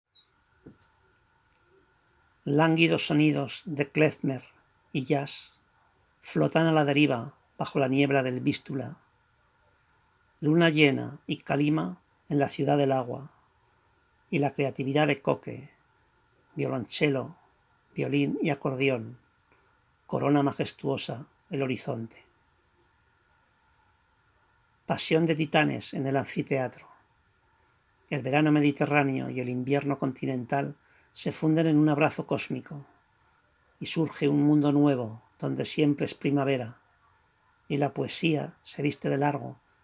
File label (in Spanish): Lánguidos sonidos de klezmer y jazz